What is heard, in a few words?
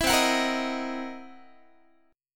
C 7th Sharp 9th Flat 5th, C, down, Guitar; strummed; 4A,4E,5A,5D,5G#,6C#; chord; 19,20,21,20,19,x; JGuitar